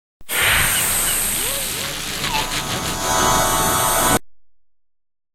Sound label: jingle; EUROSPORT; 2006 Jingle; PUB WM 06